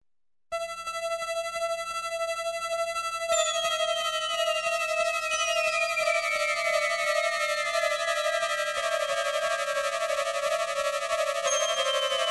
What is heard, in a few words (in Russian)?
sintezator napryazhennaya melodiya